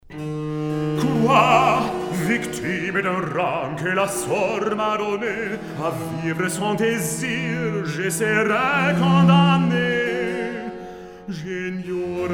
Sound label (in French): Recitatif; Jupiter et Europe